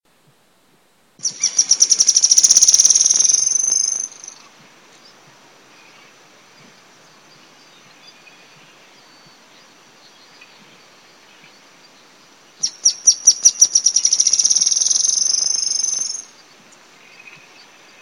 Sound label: Lemnoctites rectirostris